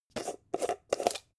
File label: jar plastic midsize open long